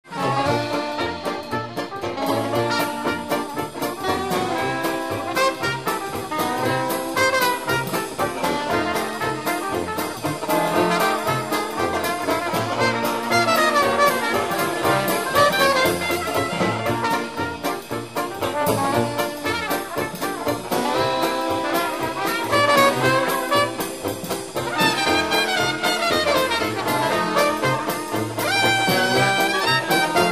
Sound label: honky